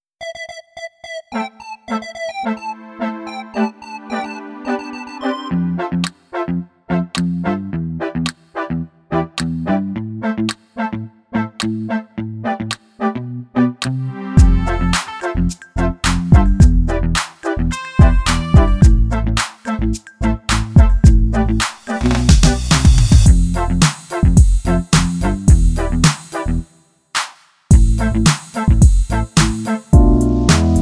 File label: beat drum